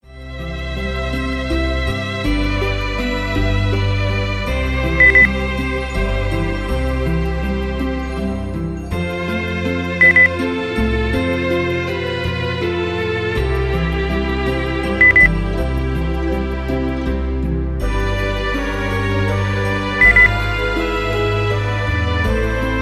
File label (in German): weihnachten, beep